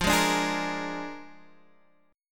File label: A sharp 7th F, Guitar